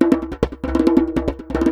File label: Djembe loop 140 BPM; percussion; musicradar